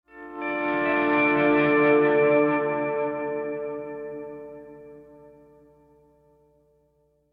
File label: Pleasant Guitar